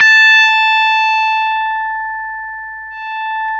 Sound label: GTR, Dirty; Club-50 - Foundations; Paul-Stack